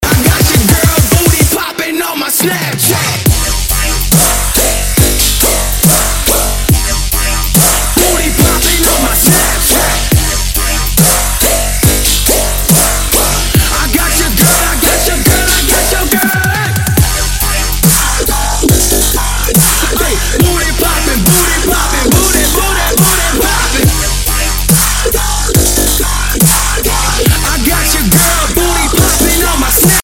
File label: dubstep ringtone